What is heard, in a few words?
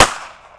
gun